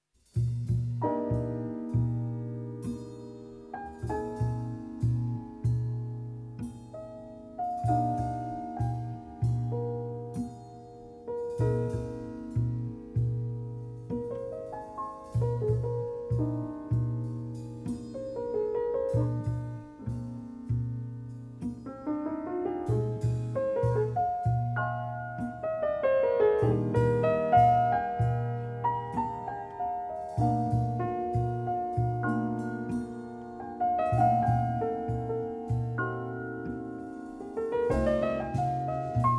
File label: Concerto for 2 pianos in C minor Adagio